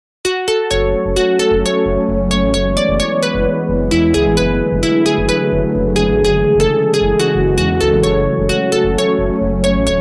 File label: SMS RINGTONE